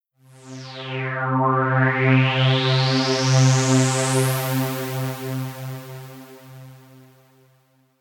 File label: Synth Env Flt Env